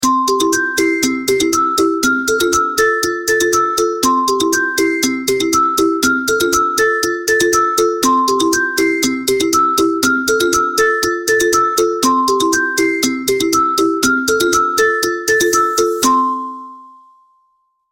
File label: Kids Alarm Ringtone